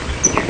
Empidonax difficilis, Texas, Brewster County, Big Bend National Park, Boot Spring; Passeriformes; Tyrannidae